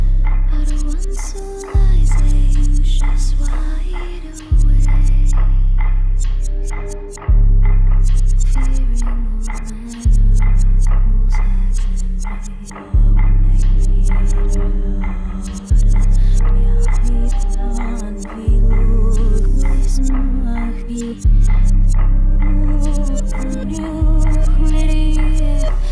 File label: dark woods, beat